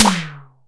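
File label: SDS V SNR, snare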